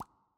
Bubble Small Pop

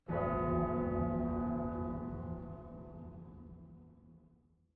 Bell Tease; bloom minigame

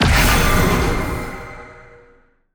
Dark Binding Projectile Slow; bloom minigame

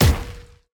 enemy boss damage shield; bloom minigame

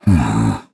Nonverbal Grateful; Ornn

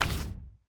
Comic UI Button Next Prev

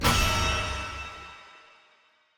UI Event Style Rank B; bloom minigame

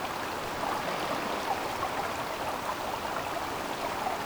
Amb Creek; bloom minigame

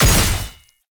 Attack Jump; bloom minigame

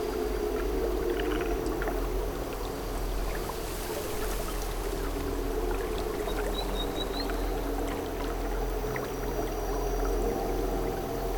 Amb Pink Leaves; bloom minigame

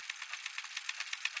Safe Is Rotating; Puzzle

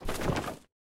item rummage books scrolls; courtyard

